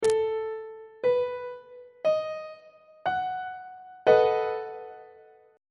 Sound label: b dominant 7th 3rd inversion on treble clef